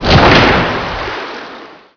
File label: Vehicle Speedboat Into Water; Playable Tutorial Level; TR2